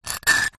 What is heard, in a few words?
foley glass sharp broken piece scrape scratch another